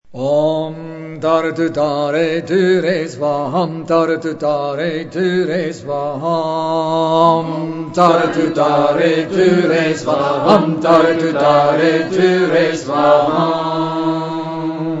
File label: mantra